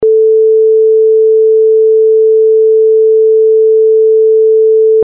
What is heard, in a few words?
sine 440